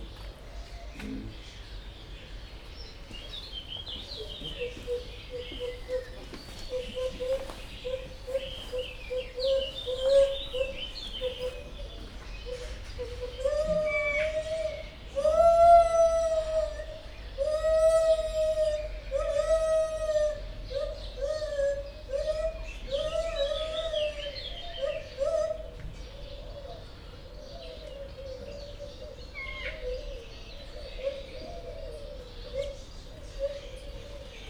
egy varjú is, meg én is, fehérkezű gibbon; jaszberenyi zoo 2016

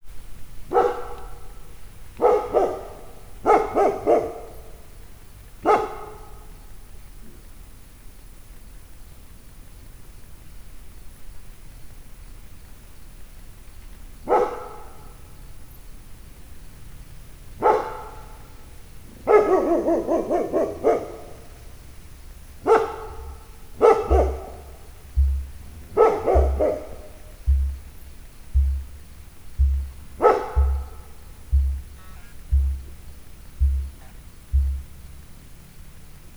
emu is, kutya; miskolci zoo 2009

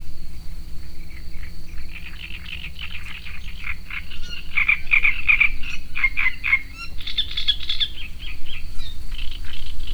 nádirigó; termeszetben

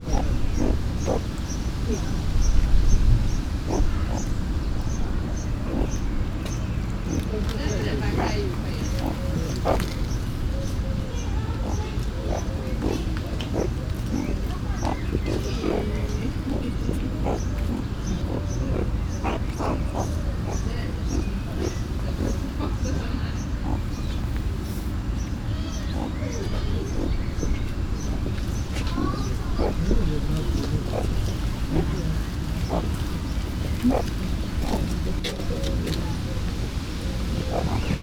erős környezeti zajok szél, szurikáta; allathangok; veszpremizoo 2013

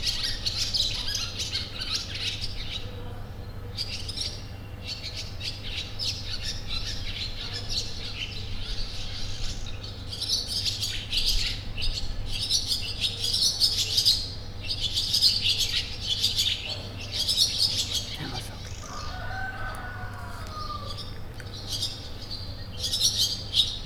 partifecske belebeszélés; allathangok; termeszetben; potamia

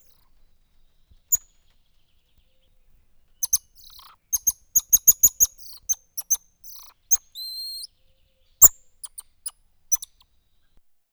aranyfejű oroszlánmajom; szegedi zoo 2011

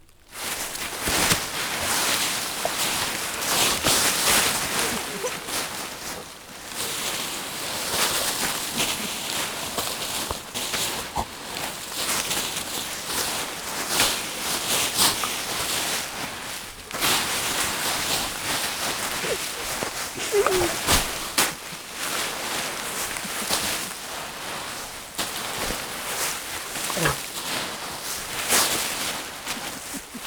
lombban kutyával való hempergés, lombba ugrálás; varboc

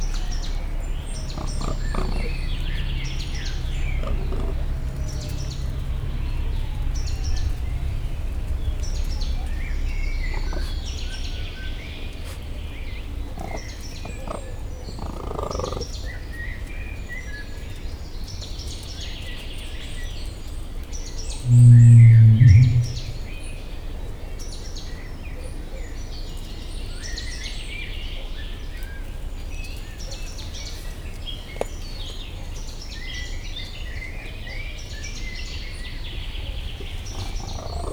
mosómedve nandu; pecsi zoo 2017

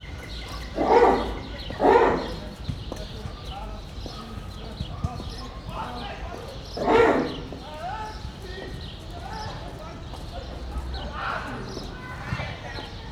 amint a majomházból hallatja magát, vari; debreceni zoo 2012

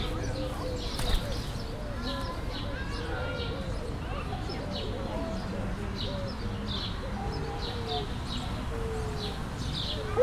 a vonat és a kacagó gerle egymással való viszonya; kecskemeti zoo 2016

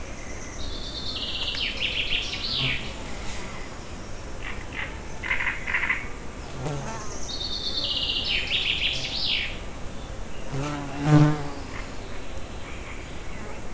kérgerovar tiszababolna; termeszetben

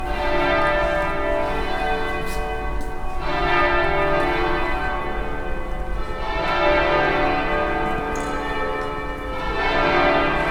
székesegyház épületből a harangozás

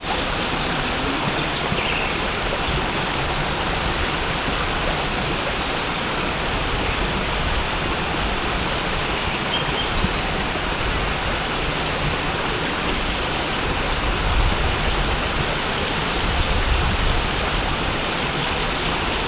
ket hangu patak, madar, szello; visegrad

